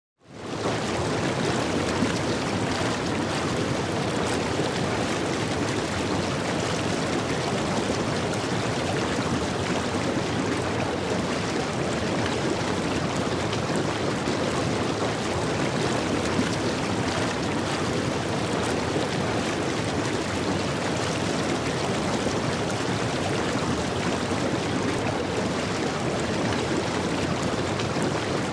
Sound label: Hot Tub High Speed Jet